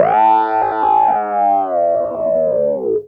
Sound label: Clavinet; Ambient Grooves